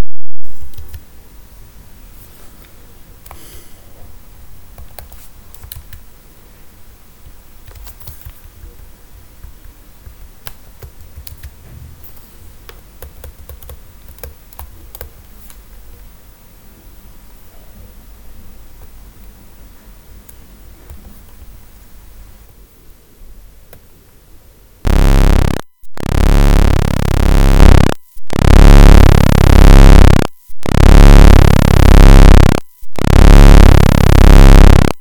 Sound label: pwm